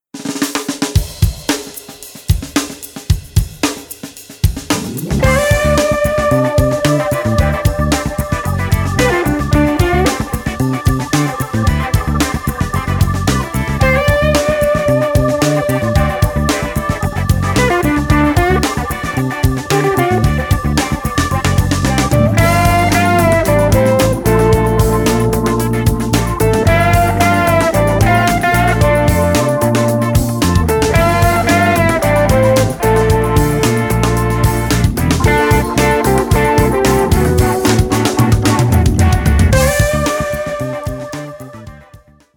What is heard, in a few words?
Phunky Guitar